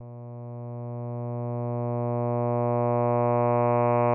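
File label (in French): A, un "a" d'une voix de basse, variation de la pente spectrale TL de 40 à 0, sans vibrato; AteliersHenrich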